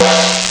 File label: gong; Amiga Soundtracker Sample Packs